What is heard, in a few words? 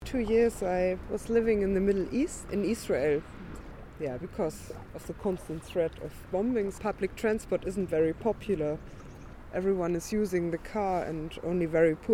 bombing; kayaklibre; 2011 London